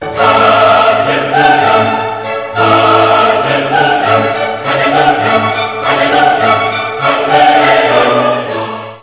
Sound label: hallelujah